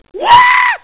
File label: SCREAM